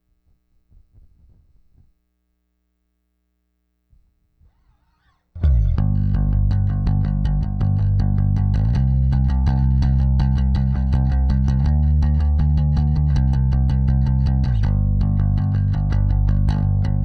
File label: Bass